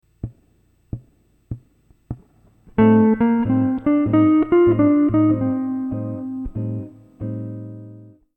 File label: Modal Licks Locrian Line